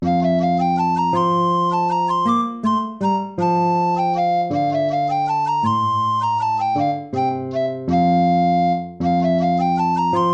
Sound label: piece for lute